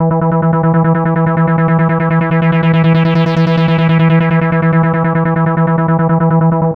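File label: SYNT -R, synth, Drum & Bass, 145 BPM; Ueberschall; Drum & Bass Resonance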